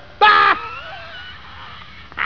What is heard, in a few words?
laff laff